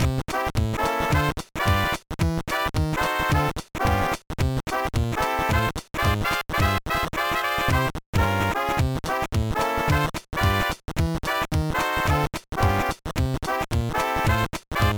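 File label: background music; No No No Puzzle Chailien; GBA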